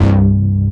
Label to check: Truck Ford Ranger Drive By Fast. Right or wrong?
Wrong